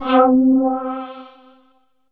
syn c3, Synth Choir, EMU E-III; Retro Sampler

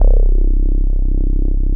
BASS R, Drum & Bass 170 BPM; Ueberschall; Drum & Bass Flash Trackz

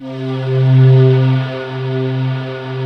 pan pad C3; PANY PAD

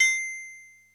TRIANGLE, D110 DRUMS; Crypton - DrumBase5000

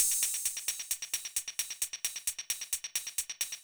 Beat, Old Skool House, 132bpm

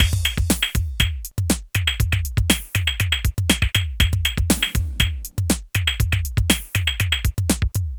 Technic Beat; Sonic Foundry (Sony Creative Software); Myths of Technology; Composite Beats

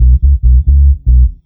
bass loop, Ragga & Dub Reggae; AKAI; USB Soundscan vol.27

